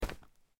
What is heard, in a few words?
disconnect